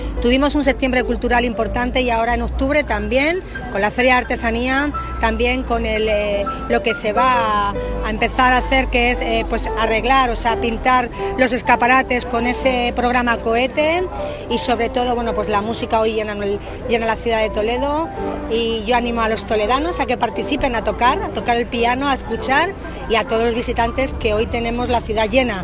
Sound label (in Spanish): pianos